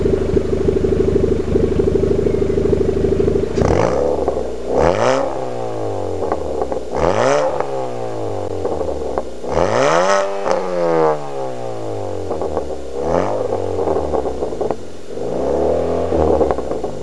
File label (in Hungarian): ford fiesta, Kipuff hangok